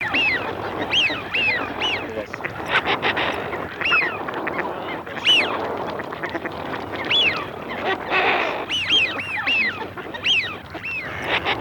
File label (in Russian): Свиязь Fischione; plurifon